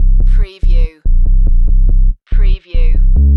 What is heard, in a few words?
Techno-Trance Sub Bass Loop in C 142 BPM